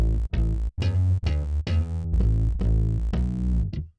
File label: bass; musdb18